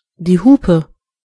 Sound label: air horn; gcompris